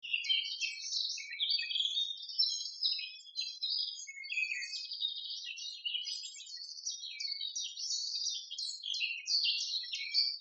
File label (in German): singvoegel zwitschern